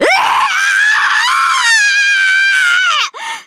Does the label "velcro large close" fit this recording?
No